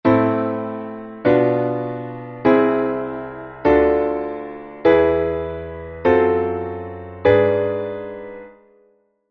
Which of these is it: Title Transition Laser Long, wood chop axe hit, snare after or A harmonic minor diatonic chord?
A harmonic minor diatonic chord